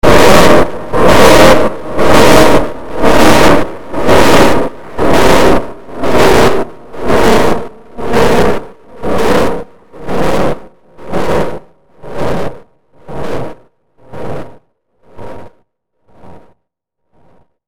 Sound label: Distortion Chopper Slow Long Pulses; Blastwave